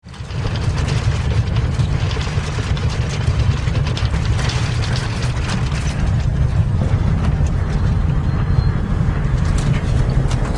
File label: Approaching armored convoy on the battlefield